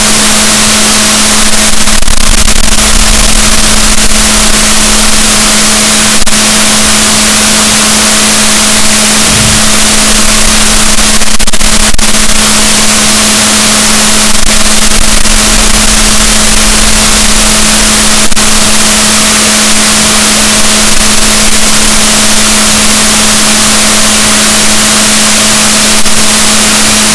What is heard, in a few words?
rorquals positif, gaussian noise, BalaenopteraPhysalus Chile